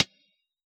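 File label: hi-hat T9 Low Closed, Yamaha DJX; 155 DJX Kit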